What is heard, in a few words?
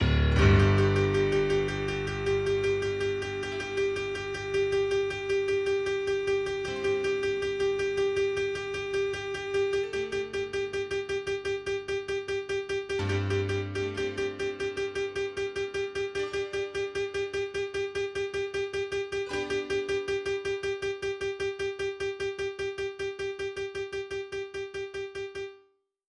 melody in strings